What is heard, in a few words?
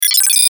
button blip; Multimedia; Buttons